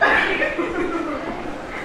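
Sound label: airy and quick female laugh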